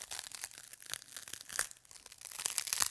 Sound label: ice cracking